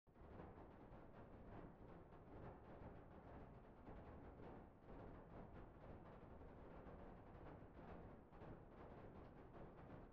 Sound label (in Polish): blacha po ociepleniu dzwięk